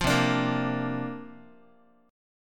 C 9th Suspended 4th C down Guitar 4A, 4E, 5A, 5D, 5G sharp, 6C sharp; C9sus4 strummed; JGuitar